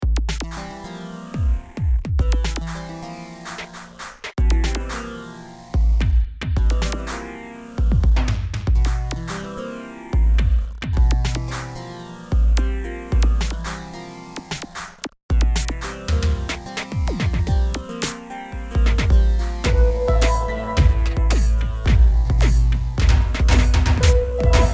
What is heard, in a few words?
techno chase, music